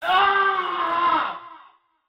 scream

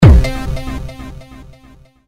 player disconnect

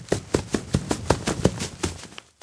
jump; freak fortress 2; fnaf; foxy

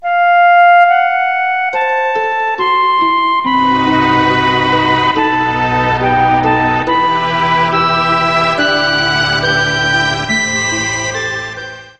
fail theme; tf; danmaku fortress

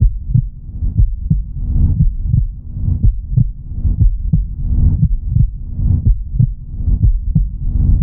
shape static; tf; slender

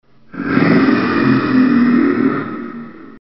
zombie; dod

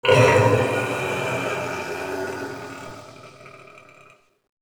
wretch tunnels amb idle; A Machine for Pigs

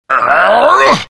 jump; Skeleton King

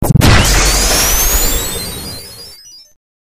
ar2 hit

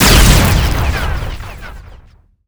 bot explode

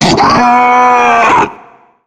charger spot prey, zombie; Left 4 Fortress

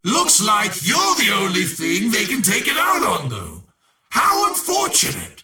spawn; death wish

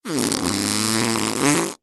fart; hl2dm

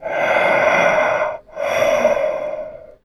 shape breath; tf; slender

